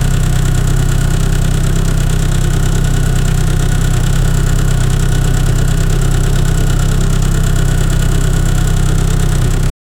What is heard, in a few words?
epic cinematic kawasaki, motorcycles